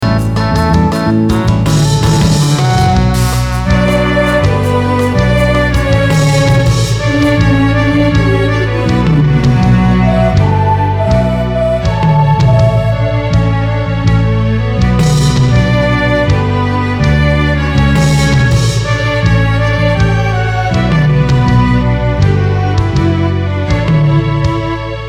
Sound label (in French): Tomes et General, Tomes dans l’arrangement; Basse, medium et aigu.